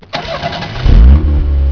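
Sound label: car street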